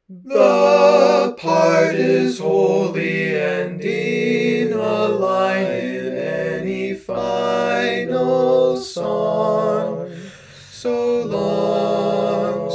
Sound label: All Parts, barbershop